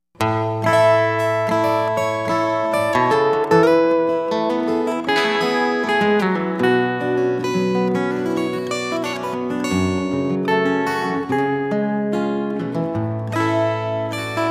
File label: Country Loop, guitar